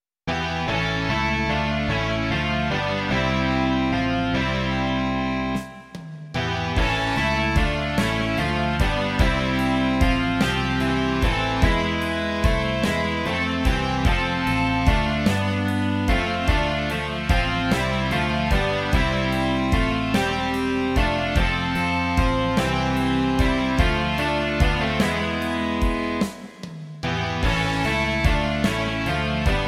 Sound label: rock